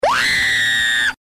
Scream; KoroneScream; svencoop

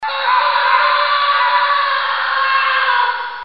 horror; svencoop; FZSaySound

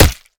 hit, knuk; svencoop; ins2

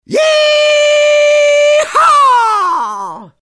yeehaw; svencoop; FZSaySound

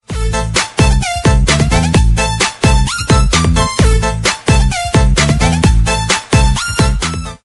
ding; svencoop; FZSaySound

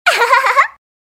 ahahaha; svencoop; FZSaySound

